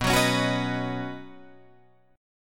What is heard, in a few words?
B Major Add 9th B Guitar Drop D